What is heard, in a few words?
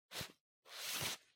Sensu Case Put In